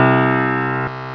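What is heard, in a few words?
HARPSICHORD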